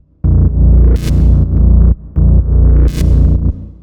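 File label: Bass Loop 125 A minor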